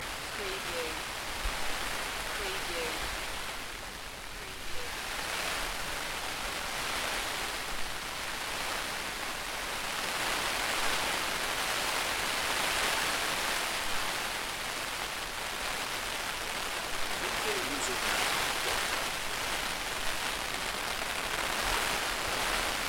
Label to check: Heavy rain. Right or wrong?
Right